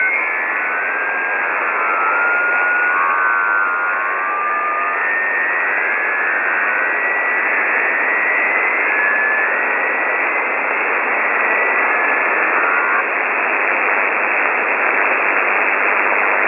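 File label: websdr whales 6694kHz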